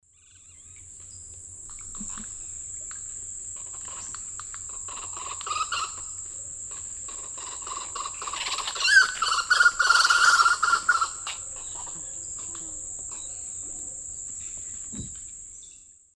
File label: Crotophaga major, group calls; S. America; Eastern Lowlands